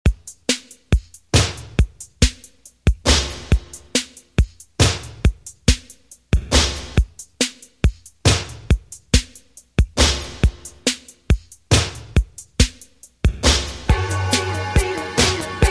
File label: 80s Dj Intro Outro 139Bpm